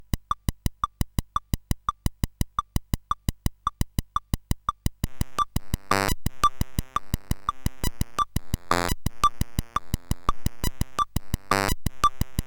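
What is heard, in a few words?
Bossa Nova, MOS 8580 R5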